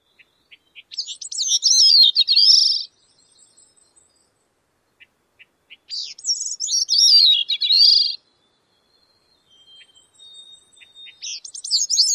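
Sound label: Bicknell's Thrush song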